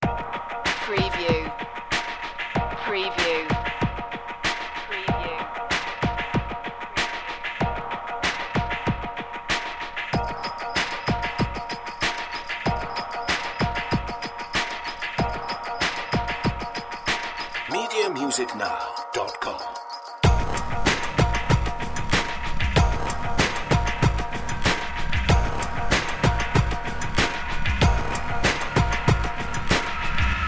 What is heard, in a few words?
tick tick